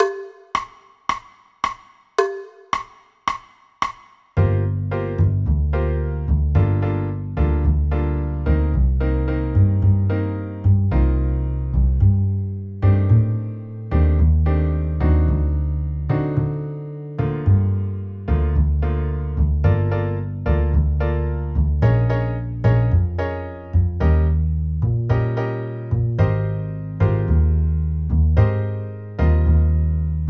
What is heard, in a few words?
Bossa Nova - Drum Set